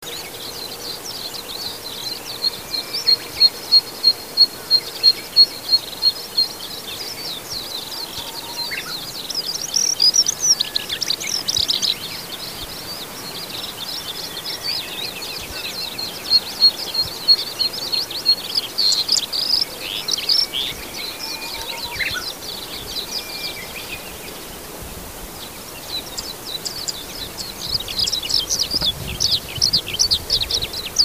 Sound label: hibari, birds